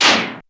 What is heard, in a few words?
BEAT, FX PERCUSSN